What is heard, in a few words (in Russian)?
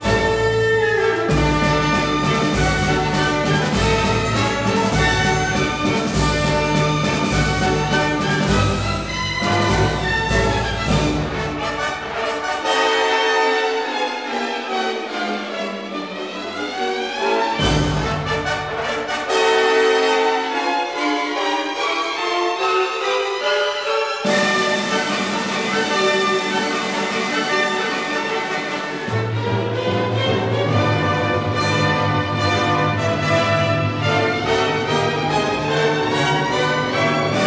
Фанфары; Вагнер; Риенци